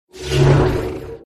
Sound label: skill bullet; dark